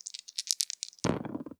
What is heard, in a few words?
dice Finish